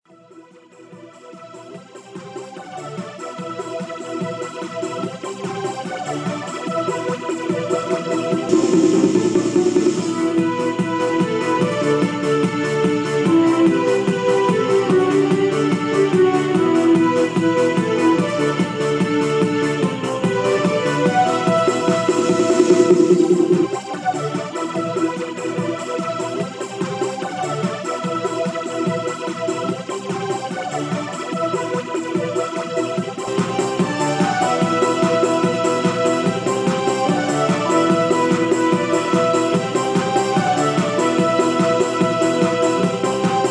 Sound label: remixed